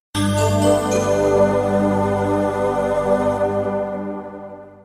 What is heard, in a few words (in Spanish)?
tono tin tin tin tinnnnn; SONIDOS WHATSAPP